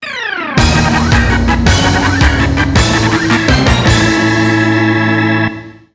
Jingle ~ Rival Face-off!; mario super sluggers; wii

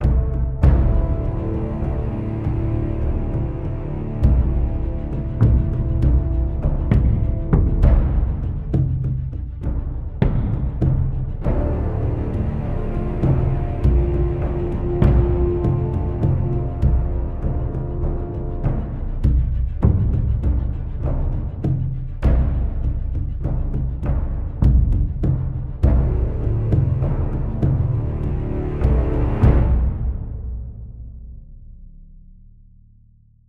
Loop Fight

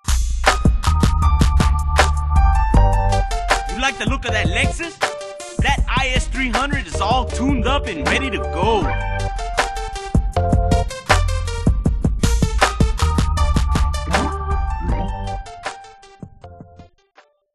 Reward

